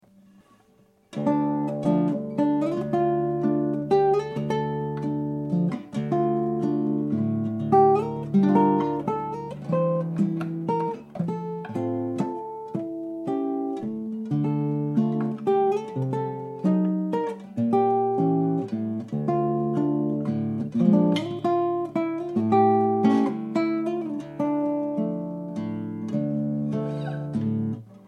Livingroom DAT recording without sound effec, guitars; El Testament d Amelia; recording by Silvestre Borras